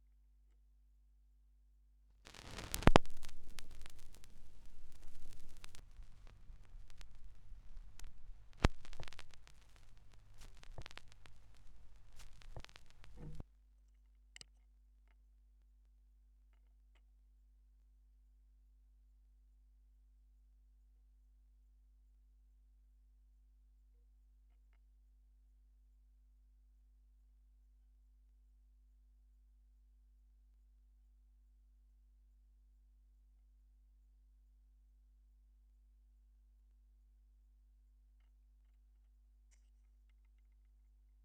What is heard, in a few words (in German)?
schallplattengeraeusche; rauschpartikel